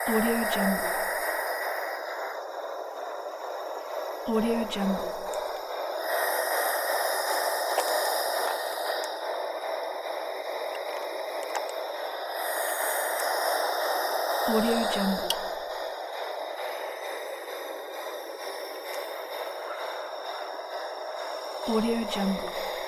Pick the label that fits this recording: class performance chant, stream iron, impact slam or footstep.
stream iron